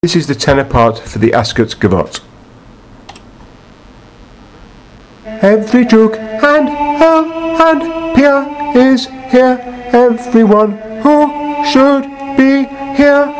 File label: tenor ascot